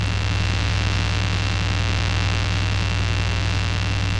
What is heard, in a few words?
Fire, weapon fx; lancer weapon fx